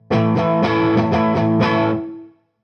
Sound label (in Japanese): ジャンジャンジャージャジャンジャンジャ